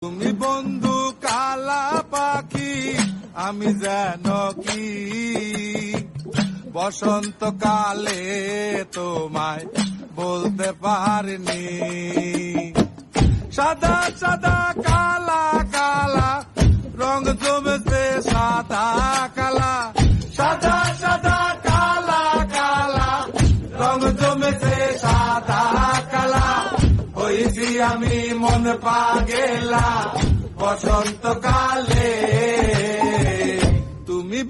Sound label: Bangla song